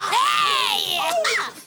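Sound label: FEMALE RAVE; Spectrasonics; Heart of Africa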